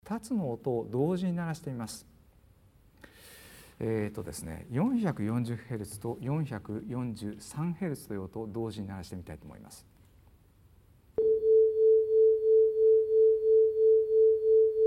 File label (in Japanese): うなり443-440